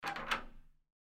door

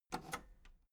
metal lever button radio enable medium

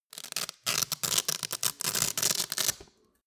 velcro undo roomy long

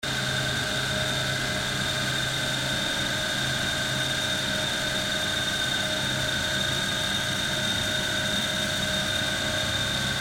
machine noise smooth agressive

